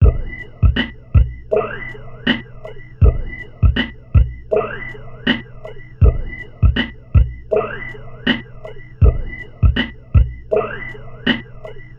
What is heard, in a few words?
Subtle Loop; Sonic Foundry (Sony Creative Software); Esoterik Beatz